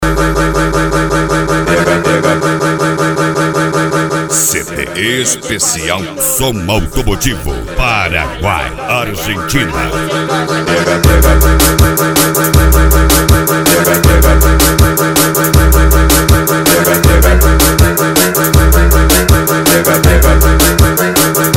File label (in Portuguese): som automotivo